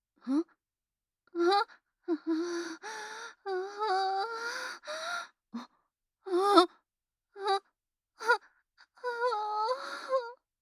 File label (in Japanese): お?♥おっ♥おおっ♥おほぉ…♥